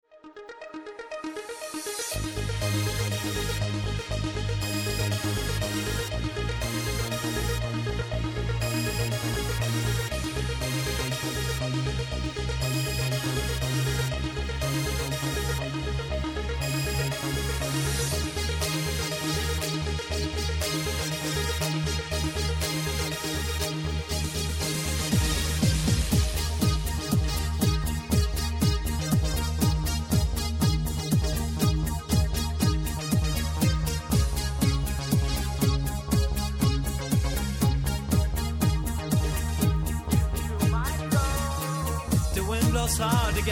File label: dance